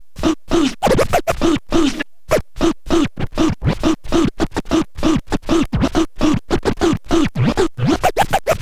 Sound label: scratch med, Vinyl Attack scratches mix